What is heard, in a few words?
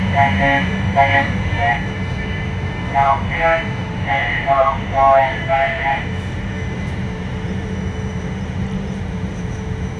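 indian train interior with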